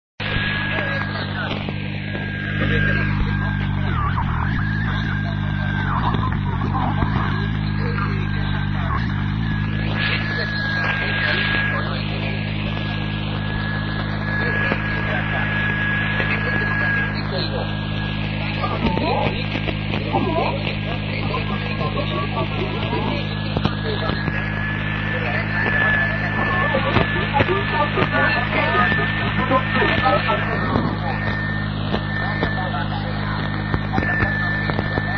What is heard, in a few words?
usb; ocpt kinshasa; rainer brannolte, d